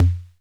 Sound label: SD 808 Pitched